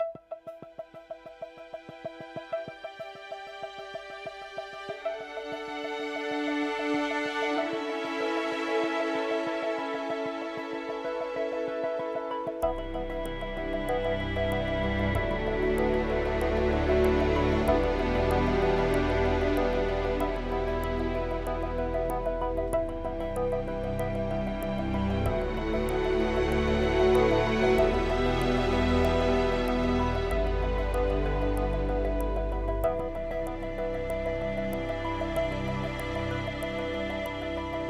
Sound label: Music Post Race 95bpm; Forza Motorsport 6 Apex